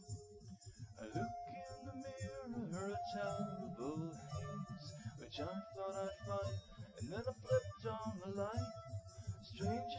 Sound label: nodrums live rec; SiSEC08; SiSEC underdetermined